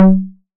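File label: 808 low conga, Tape Sat; Tape808; Goldbaby Tape808 & Tape909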